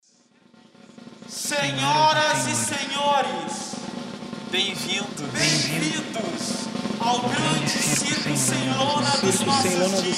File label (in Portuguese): vinheta; bem-vindos; circo sem lona